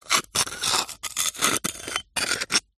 foley glass sharp broken piece scrape scratch another